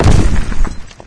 boulder impact hard, concrete; physics; Garry's Mod; hl2

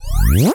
bon powerup; Alawar; Star Defender 4

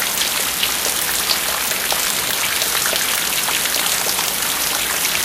water flow loop, ambient; Garry's Mod; hl2

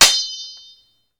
hit metal metal; Mount&Blade Warband

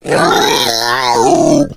female boomer lurk; voice; idle; Left 4 Dead 2

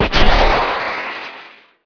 drone rocket; Emperor - Battle for Dune

